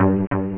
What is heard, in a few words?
Mothership Background; Bedlam